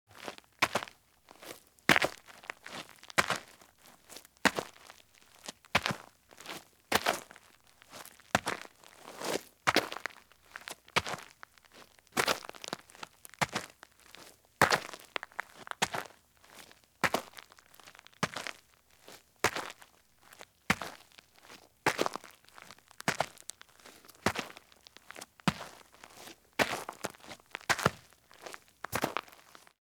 Running Shoes Walk at Slow Pace on Roadside or Driveway Gravel; Sound Ideas; Footstep Sound Effects